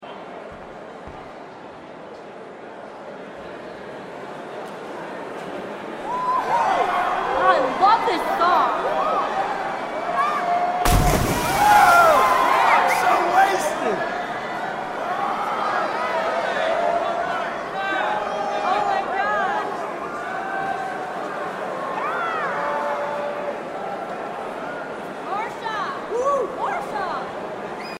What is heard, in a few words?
club inside musik; life is strange